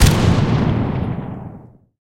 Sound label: shvak shot, guns; War Thunder